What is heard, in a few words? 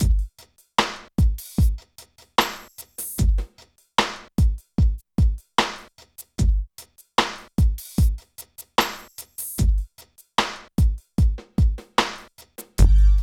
DRUM LP